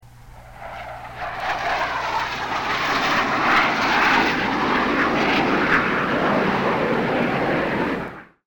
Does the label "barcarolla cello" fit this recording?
No